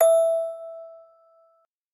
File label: musicbox